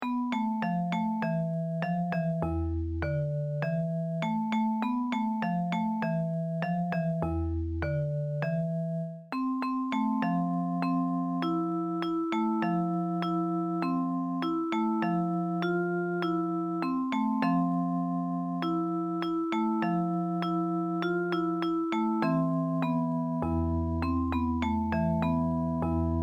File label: japanese folk song; sansa shigure